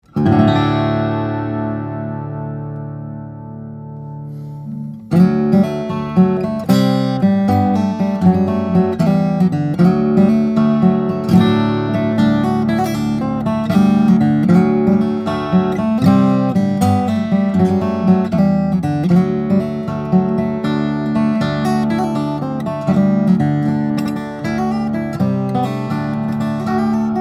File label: Orchestra, steel string flattop guitar; wilborn; New Wilborn OM Cocobolo/Bearclaw Sitka; Dream Guitars